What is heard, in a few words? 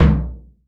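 bass drum, 50S JAZZ; Northstar - New Gold VOL-1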